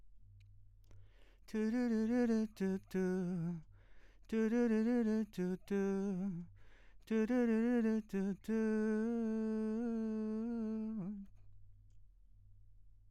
VOX

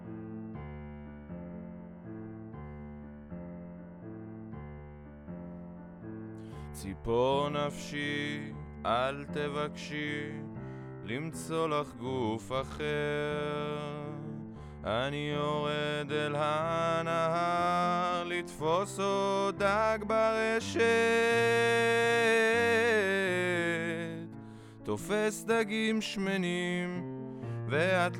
Lead B

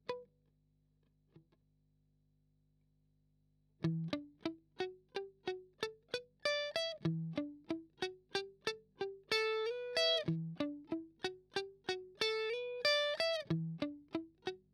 Arp

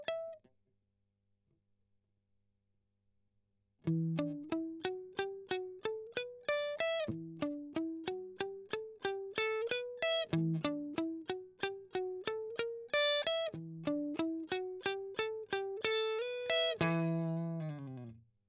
Strum Octav Arp

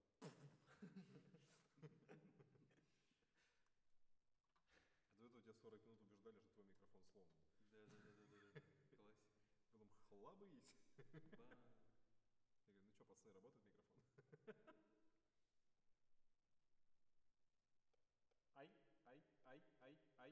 ORTF SCHOEPS, piano

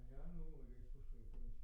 CBass Bot

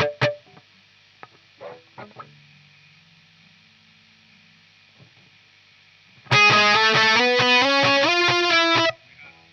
Gtr

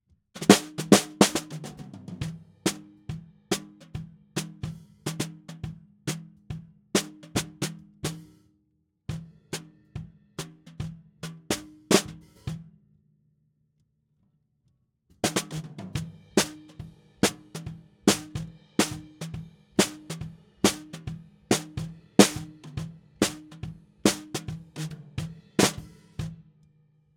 SNR BTM

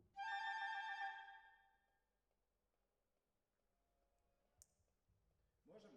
Violas, jazz for piano

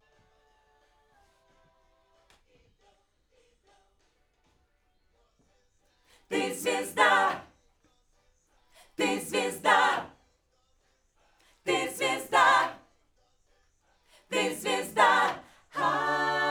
Backs AKG

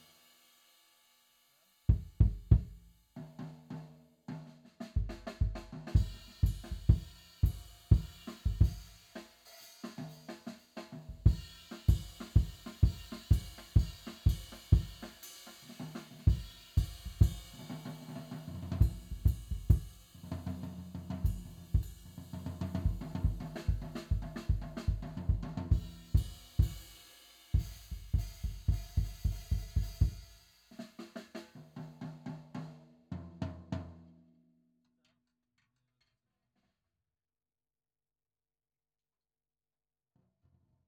Kick IN

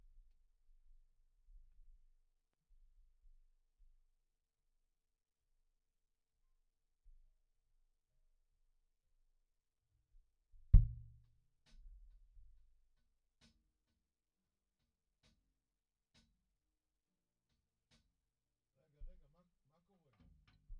Kick In

